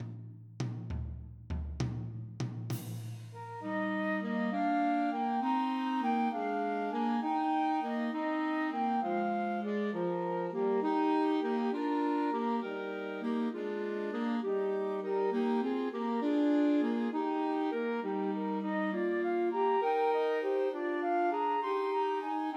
I Saw Three Ships Christmas Trios for Flute Clarinet and Alto Saxophone